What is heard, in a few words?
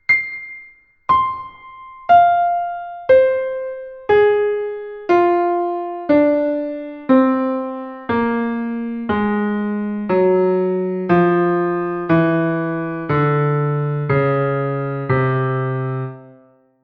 harmonic series undertones; musicologia